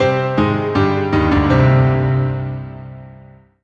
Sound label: Solo Piano Negative; startide macos switch windows gamerip 2017